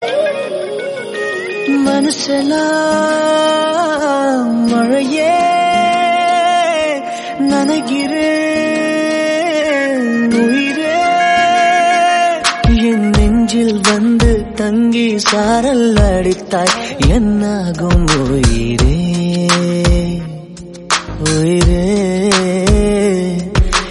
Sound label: tamil old